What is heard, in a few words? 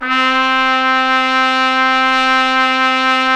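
trumpet f; Solo Ambient; Roland L-CD702; Tpt 1-4 Solo; Tp 3 Ambient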